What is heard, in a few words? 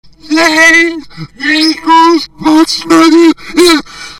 scream, zombie screams